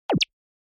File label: Motion Pop